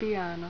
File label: piano